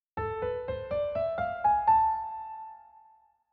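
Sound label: A harmonic minor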